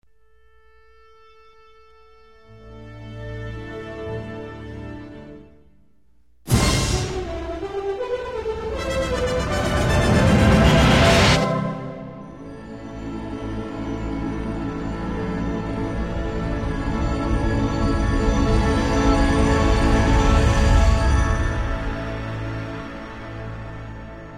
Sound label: Orchestral Sound Test; THX; Bass Test